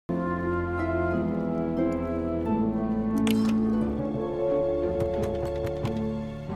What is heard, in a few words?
audio slot